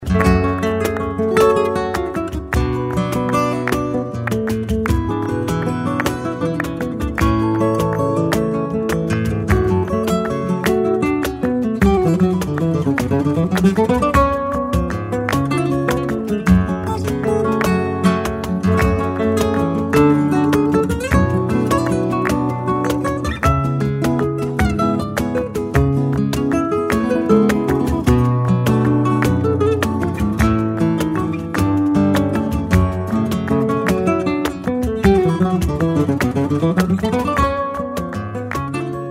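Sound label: lamento vals, flamenco guitar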